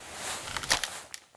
ready; garrysmod

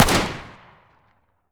famas fire, weapons; garrysmod

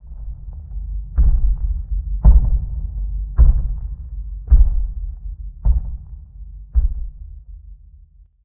stomp; garrysmod; stalker

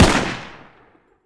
sako fire; sako92; garrysmod

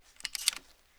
rem870 insert, shotgun; weapons; garrysmod

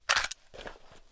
m16a2 mag out, weapons; garrysmod

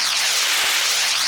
m79 projectile; explosive; garrysmod

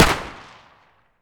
mp5 fire; weapons; garrysmod

